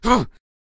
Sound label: Stoner Attack Vocals